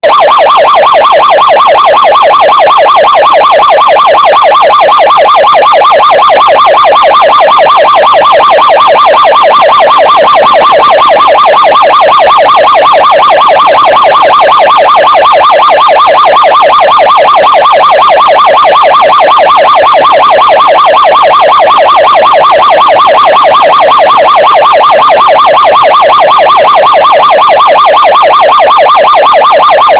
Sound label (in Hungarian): mentő